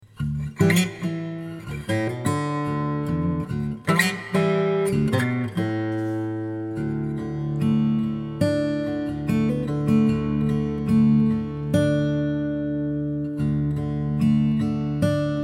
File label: Fingerpicked Acoustic Guitar; Magneto machine set to 4 heads, even spacing and max diffusion.